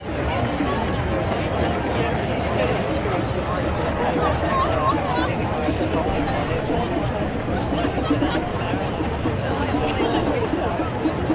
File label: Black Rock Burning man